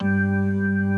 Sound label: HAM G2 -R, KEYBORD